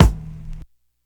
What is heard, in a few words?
snappy steel kick drum sound a key